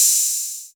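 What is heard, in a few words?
808 open hi hat; Goldbaby Tape808 & Tape909; The Tape 808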